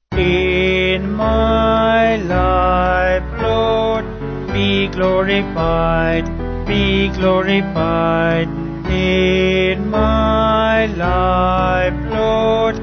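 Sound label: Vocals Band, Music Backing Files for Churches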